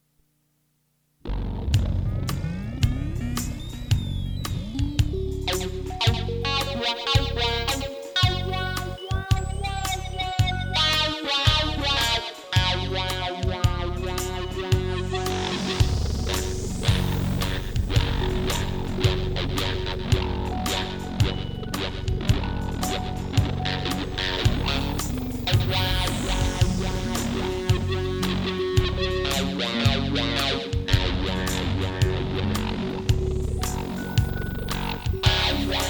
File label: Prelude